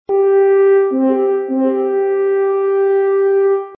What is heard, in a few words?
sms tone; Sherwood Forest; iphone